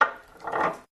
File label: Gears Mesh Loosely